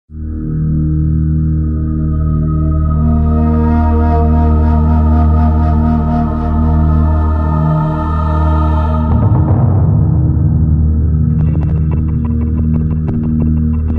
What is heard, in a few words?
ambience music